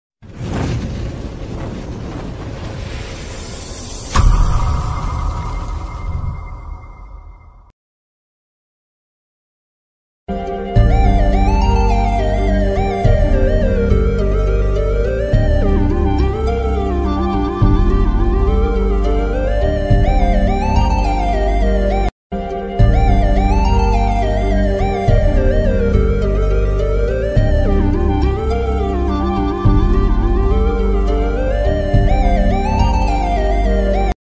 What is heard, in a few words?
jesus ringtones phone ringtones bgm flute ringtone violin bgm ringtones hearttouching bgm